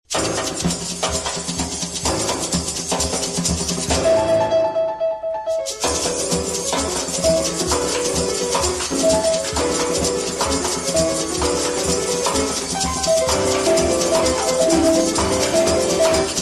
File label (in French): samba Percussions